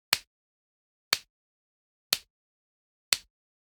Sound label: Generic Click Plastic Small